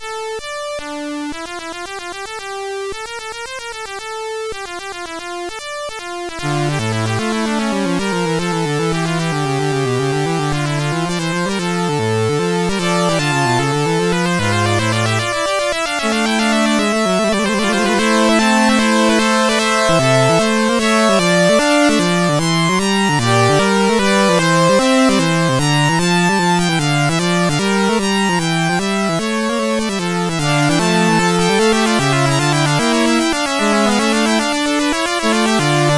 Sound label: Fugue XIII Inversa, CSG8580R5